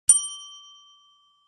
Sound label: transfer completed